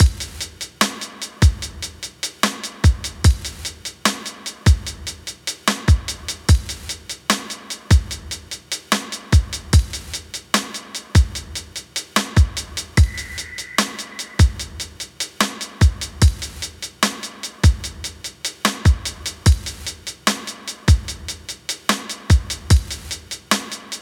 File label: Drums 148